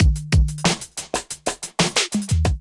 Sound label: Groove 92 Bpm, Drums; MIDI Clips; Moonlight; Ableton; Factory Packs; Chop and Swing